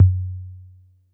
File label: Analog Tom; Yamaha PSR-E323; Dance Kit